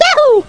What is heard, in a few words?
Yahoo; Grizzly 1.3.1